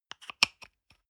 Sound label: toothpaste screw cup close soft single